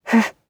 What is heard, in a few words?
laugh, JPN; Voice lIne; Eternal Return; Katja